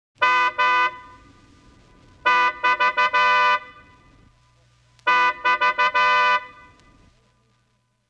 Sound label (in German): Auto Sportwagenhupe